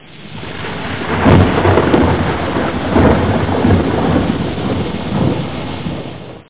orage; malediction; french

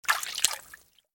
eau

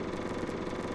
dynamo

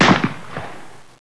Fusil; Carotto 1.0.4

